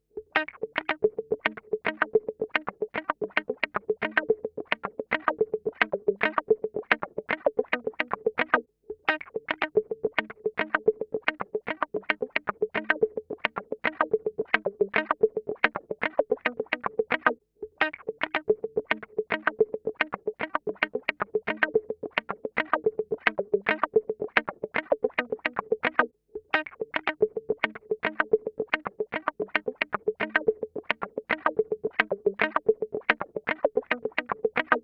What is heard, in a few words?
110 Cm Elec Guitar